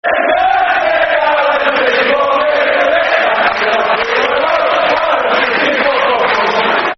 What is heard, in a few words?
Football Chant (Soccer Song), Man United